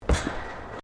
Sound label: Footsteps Boots Suspended Metal Walkway Step; Footstep Foley; Sound Effects:Human